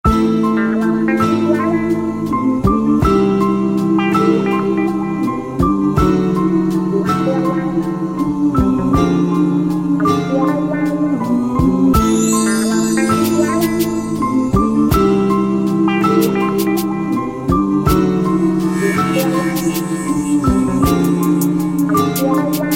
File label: instrumental